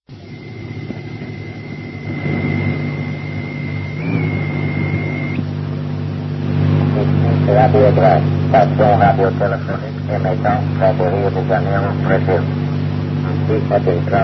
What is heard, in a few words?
usb